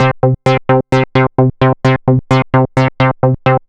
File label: MODUORG C, Modular Synth Loops; Polyend; Simulacrum Sounds